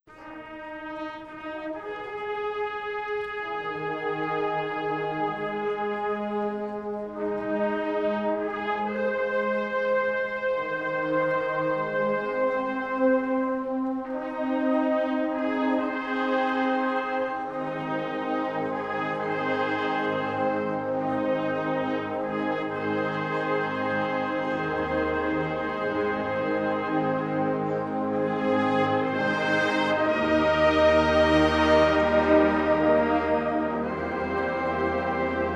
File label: Taps